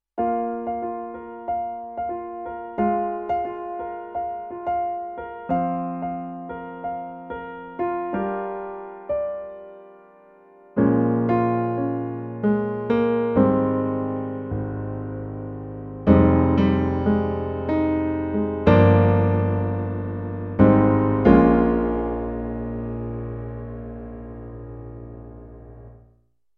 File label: Roland RP102 Mellow Piano